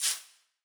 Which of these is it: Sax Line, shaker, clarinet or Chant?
shaker